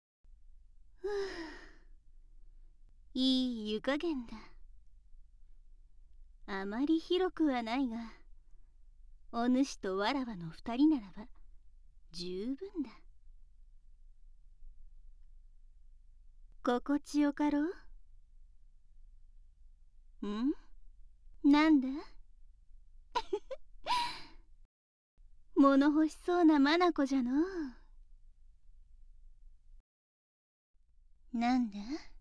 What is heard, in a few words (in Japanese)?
風呂 両耳 キス; 効果音・エフェクト無し